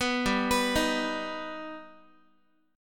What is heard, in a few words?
G Augmented, Guitar, chord; arpeggiated; 14,15,14,13,x,x; 4A,4E,5A,5D,5Gsharp,6Csharp